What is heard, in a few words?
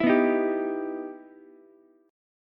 E Minor Major 7th, Ukulele; EmM7 strummed